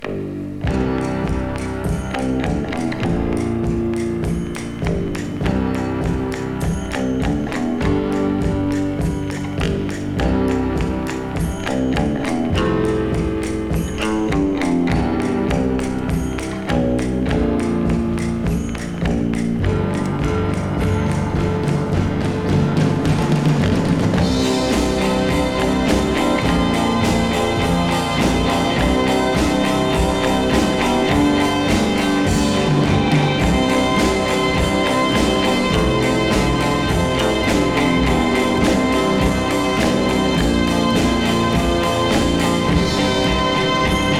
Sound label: rock instrumentals